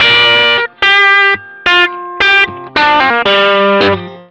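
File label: blue riff, Vintage Blues Guitar; USB Soundscan vol.22; AKAI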